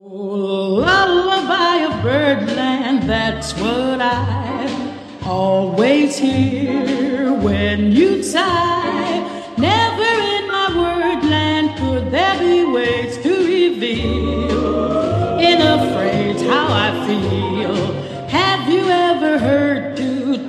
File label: lullaby